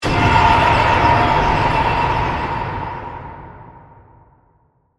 Hit To Metal Scrape; Production Element, Imaging Element, Accent, Transition; BlastWave

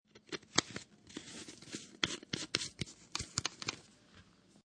Screw Plastic Cap On Tin Or Jar; Food (Sound Effects:Household)

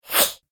Definite Swipe, whoosh; Whoosh Sound Effects; Swish, Zoom, Swipe, Whoosh, Swoop

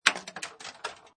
Pinball Sound Clank With Ball Travel; Video Games

